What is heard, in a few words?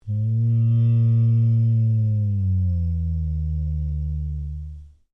Created Creature Large Exclamation, Alien Sound Effects